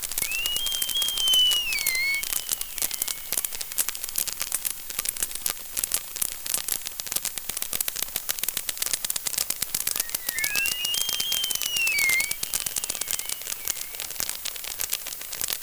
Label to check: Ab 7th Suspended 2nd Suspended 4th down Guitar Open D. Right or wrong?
Wrong